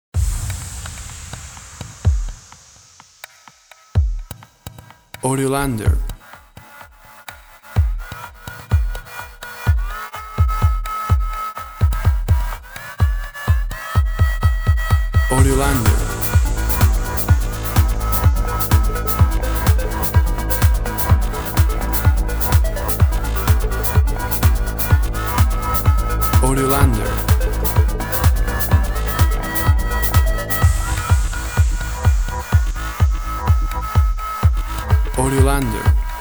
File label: Top 40 EDM; Top 40 modern chart EDM. A fast paced piece of elec